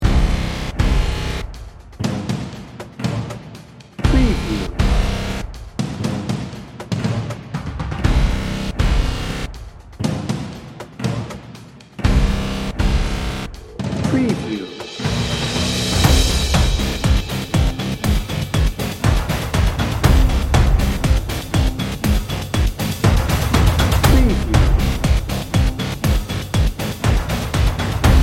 warrior march dnb

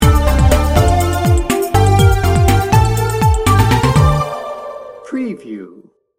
futuristic vision sting bumper

